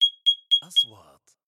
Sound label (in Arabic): صفارة إنذار; transitions